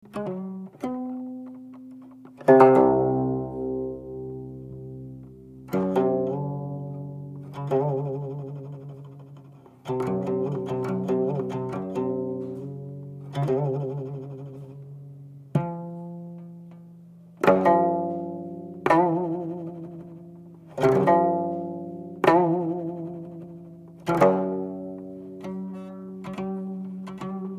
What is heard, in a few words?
komungo meditation